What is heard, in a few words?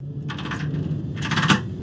Lever Int Crane Move; levers